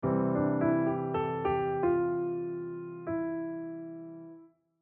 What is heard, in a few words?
sub-dominant pull